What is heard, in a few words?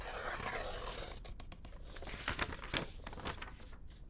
Cut Paper, paper cutter; Micro Pack